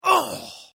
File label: Male Grunt Human Injury Pain Vocal Ugh Ouch